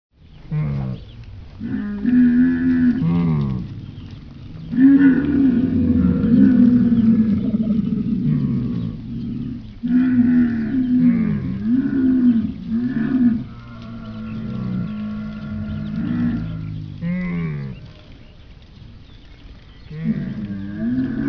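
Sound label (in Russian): verblyud golos, camel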